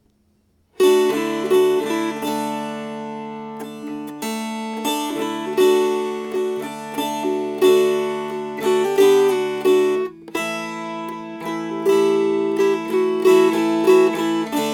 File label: Strummed